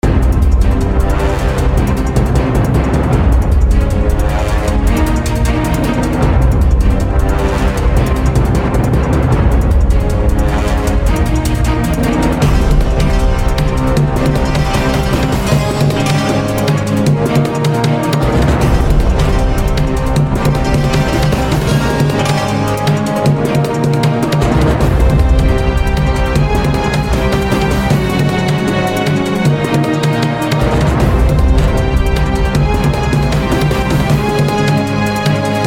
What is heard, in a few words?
music battle; dragon fin soup